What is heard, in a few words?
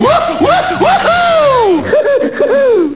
oo oo oohoo; looneytunes